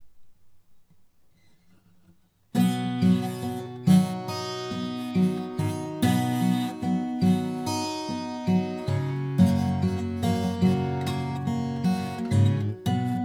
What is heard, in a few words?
pick 12 left, hsguitar